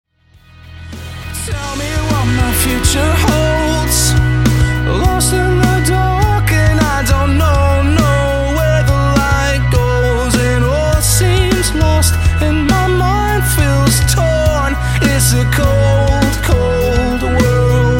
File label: Pop